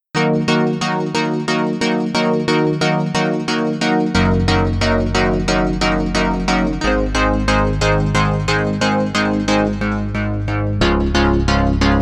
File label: play chords seq